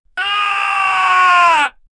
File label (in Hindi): ek admi ki cheekh realist, anguished